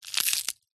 animals insect cockroach crush squash crunch

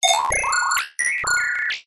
science fiction robot chirp beep metallic short

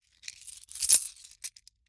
Coins Transfer Hand to Hand

